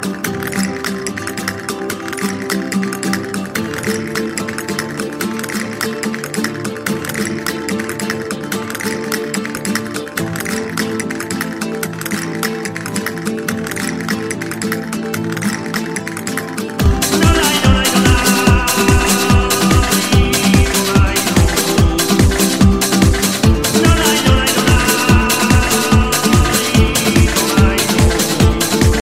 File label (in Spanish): baile rapido, tono de llamada; castellano